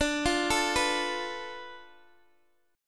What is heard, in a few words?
D Minor 6th Guitar Open G